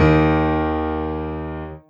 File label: Two Eb2 Eb3; osu